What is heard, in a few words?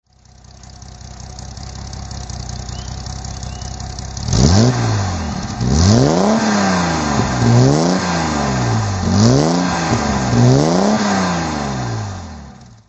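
280sl throttle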